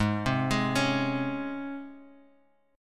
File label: G sharp Major Add 11th G sharp; G#add11 arpeggiated; JGuitar